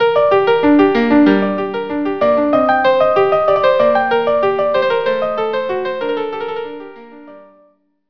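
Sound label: violin viola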